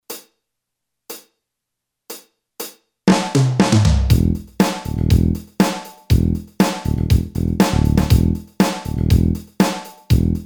16TH FEEL, Boss DR-3

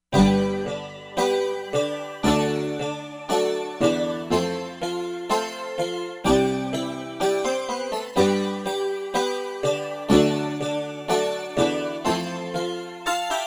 Vox Piano, Korg MSC-13S Ethnic 2

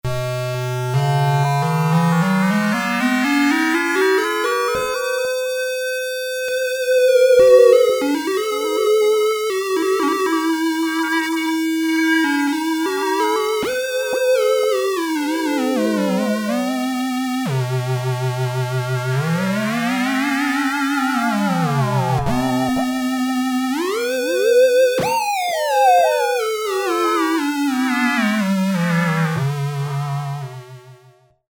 VCO 1 only, Davoli Davolisint

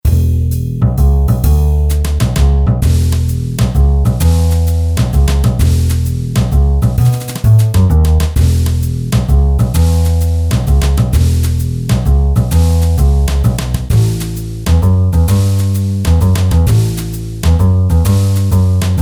JAZZ WALTZ, Boss DR-3

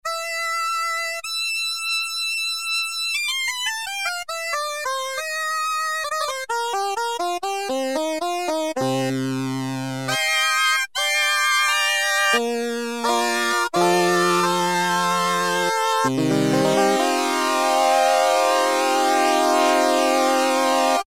BLUES HARMONICA, Casio CZ-1000

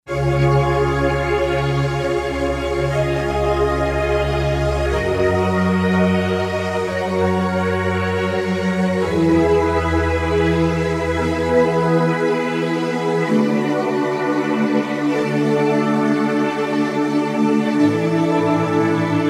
Rotary Pad, Roland SC-880; Factory Patches

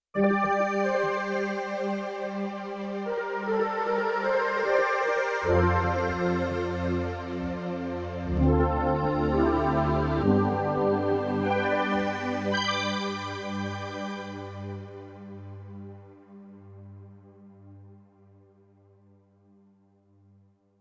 Filtertype, Spacey Keys, Yamaha TX16W; Spacey Keys 2

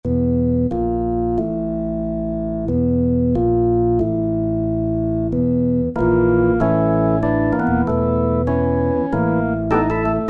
1235 Bar w Percussion 2nd, Rock Keyboards; Greytsounds Philip Wolfe's Rock Keyboards

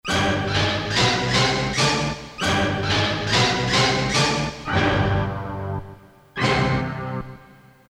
Orch, Orchestra Hits; Korg DSS-1; KSDU-011 Group & Orchestra Hits

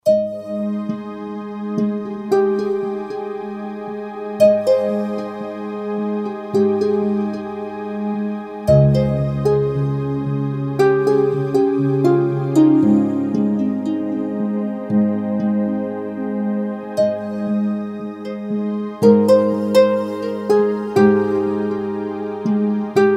C. Harp pad, Roland SR-JV80-16 Orchestral II

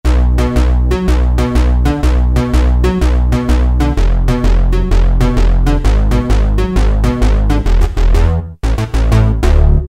Yamaha TG33; BA-Unisn

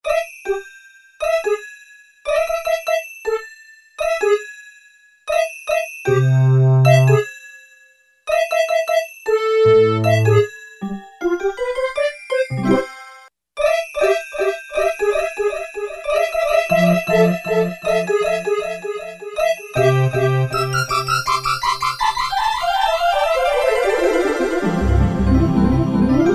Regenerated Delay 4 sec

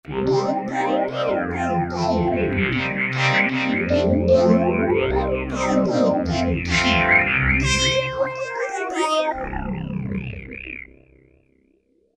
Electric Wah, Roland V-Synth; Factory Patches

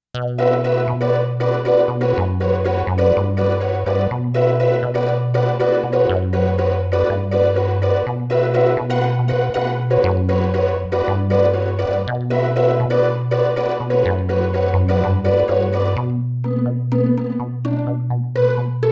Techno Pizz, Roland SC-880; Factory Patches

